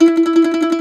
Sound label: MAND TRM, Mandolin